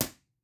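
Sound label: Live Room impulse response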